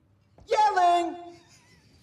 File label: yelling